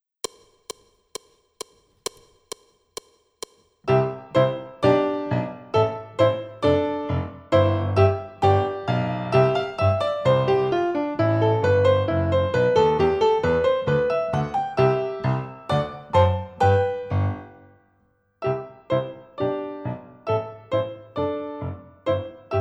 Gavotte Cello

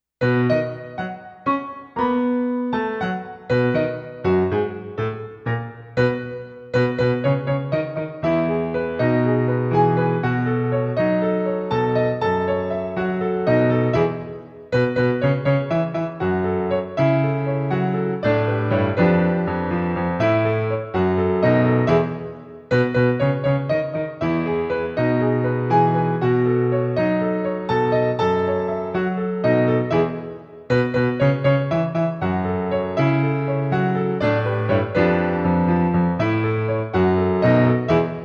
Caribbean Violin

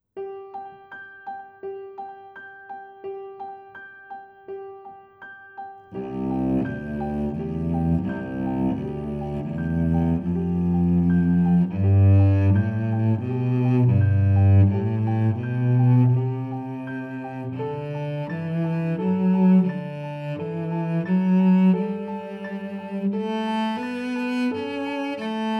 Reach for the Stars Cello

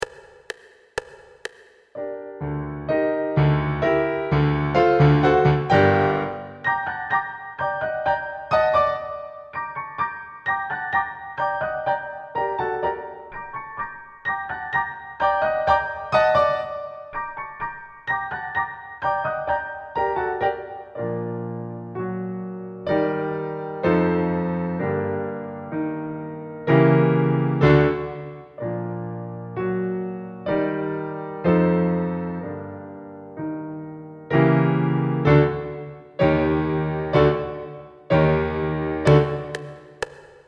Violin Acc.

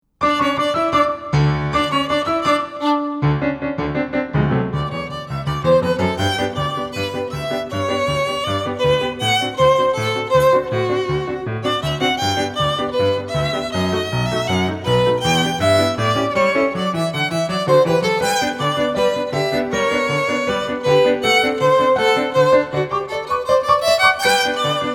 Polka Violin

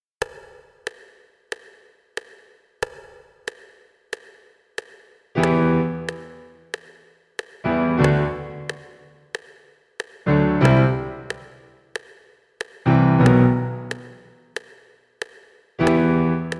Fuego Espagnol Violin Acc.

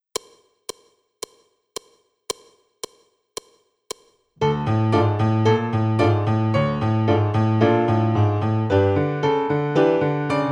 Cello Acc

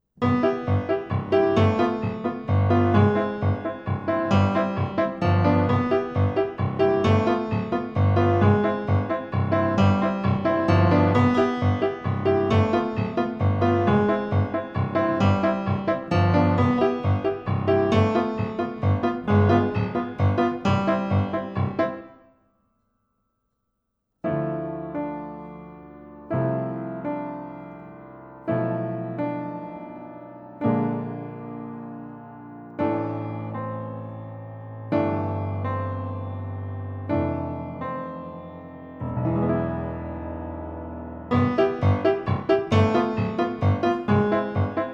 Cello; Cello Acc

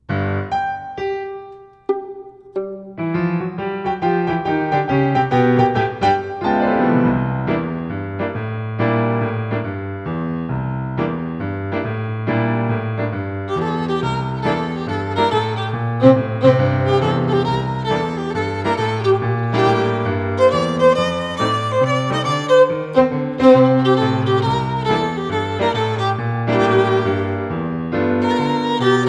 Boogie Violin

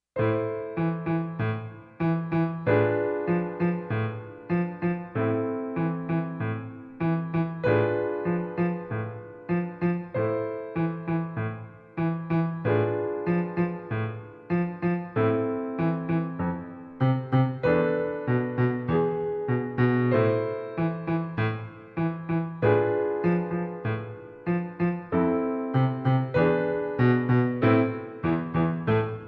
Violin Acc.

